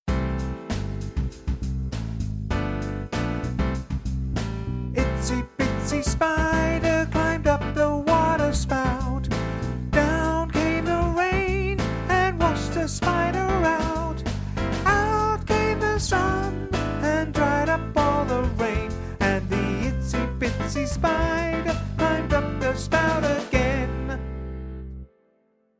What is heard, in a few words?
itsy bitsy spider with voice